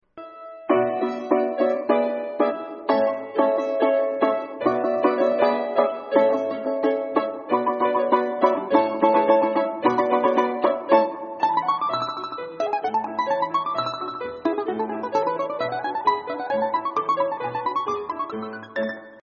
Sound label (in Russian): domra sibirskaya shutochnaya